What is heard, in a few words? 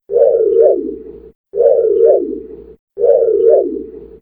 kitt scanner; knight rider